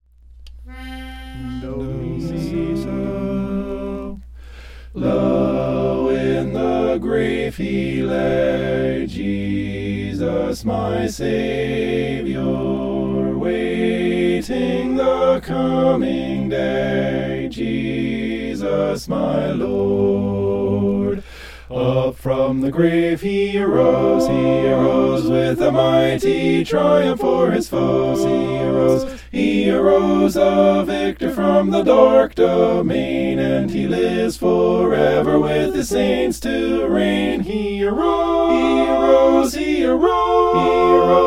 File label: mixed